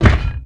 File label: dog footstep walk; npc; Half Life 2; Episode 1